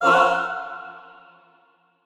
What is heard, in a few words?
chor